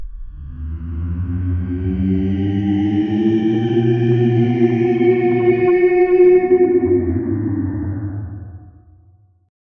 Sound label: scary dark sound